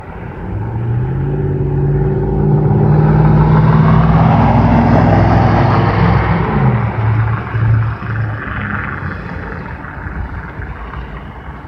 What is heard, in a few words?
Truck